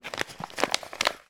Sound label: food candy lozenges packet short movement; fishermans friends; zapsplat